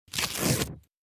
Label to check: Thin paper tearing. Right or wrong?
Right